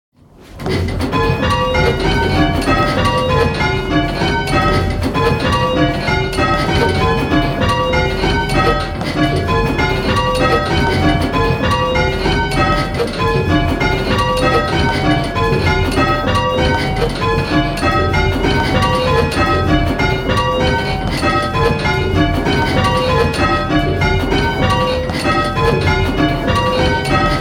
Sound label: Ionian Cambridge, bells; Major